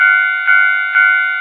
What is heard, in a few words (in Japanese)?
humikiri